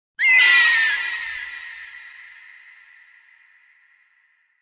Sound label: Hawk Eerie Call 4; animals